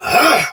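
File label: Exertion Soldier Sword Agent Male, Battle; Dialog; Barks; Melee; Sinecure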